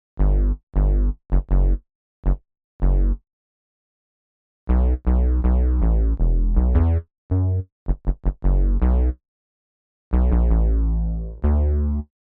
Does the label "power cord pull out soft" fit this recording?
No